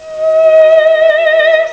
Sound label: sing